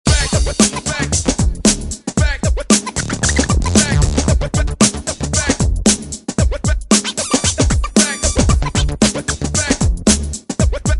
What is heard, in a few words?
Interlude Scratch Jam